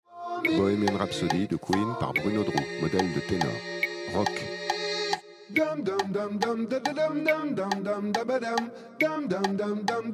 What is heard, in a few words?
Tenor Rock